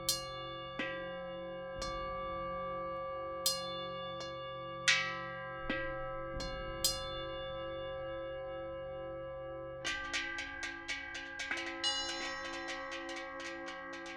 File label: bowls